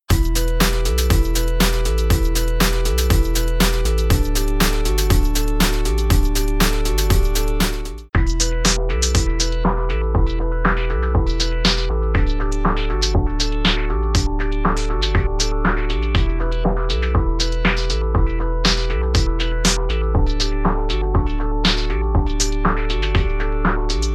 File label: Mini Simple SnH Dry; arturia